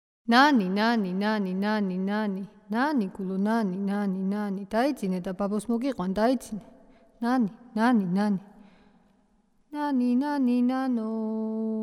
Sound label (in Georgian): nana moxauri; Mzetamze